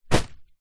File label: foley flowers fake plastic set down carpet